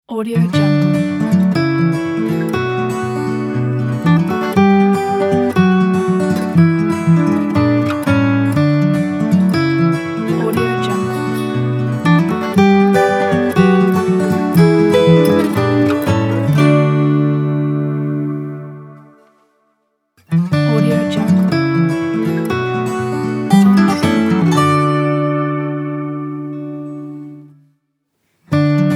positive guitar logo

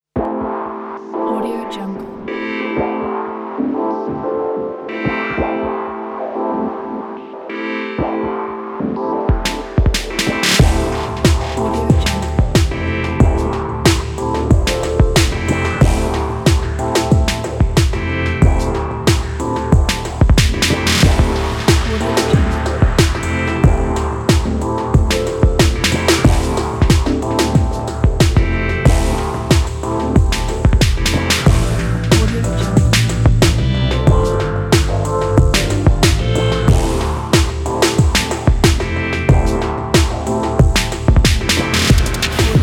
electronic daily

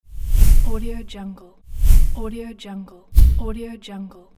light short whoosh

